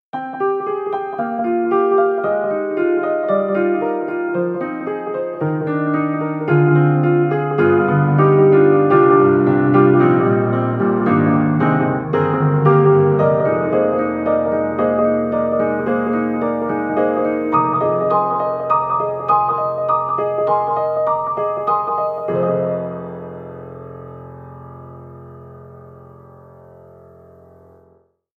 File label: KORG KRONOS 2 Piano